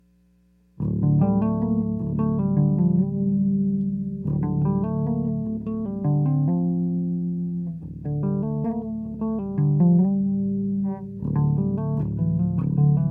Tap Bass Buildup

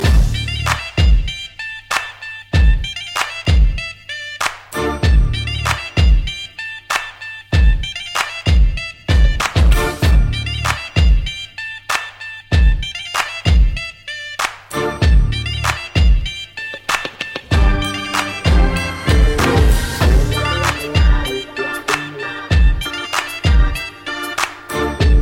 Gural Hip Hop

